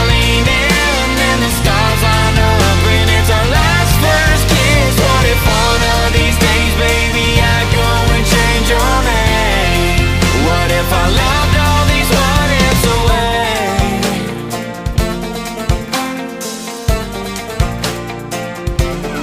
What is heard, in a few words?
ringtone, country music tone